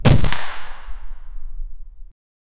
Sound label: volleyball being hit real; impact sounds